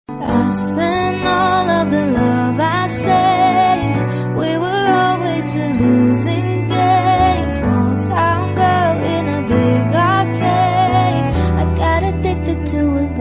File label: Female Version Ringtone